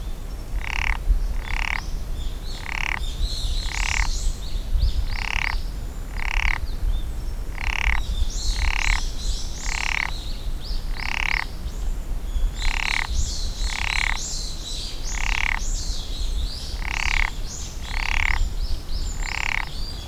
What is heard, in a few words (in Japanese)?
ツチガエル; ギューギュー; 森林総合研究所 多摩森林科学園